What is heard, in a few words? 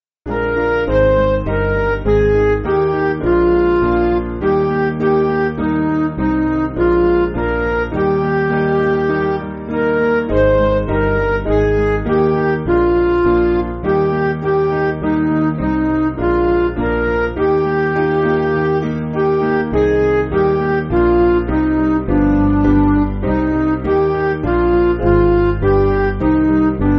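Midi, Music Backing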